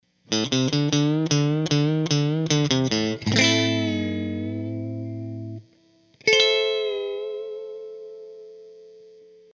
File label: Surf